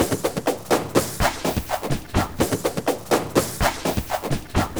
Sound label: 5-4 LOOP, DRY; Bolder Sounds - Granular